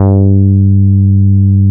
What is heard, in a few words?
NORD MF G3, NORDY BS